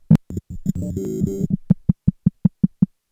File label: 300bpm; elektron machinedrum